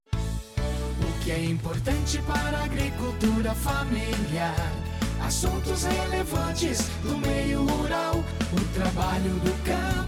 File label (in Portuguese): Instrumental com encerramento; Nova Voz da Fetag